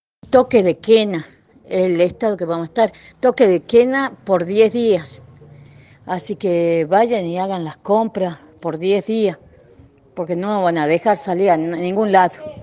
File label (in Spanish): toke de quena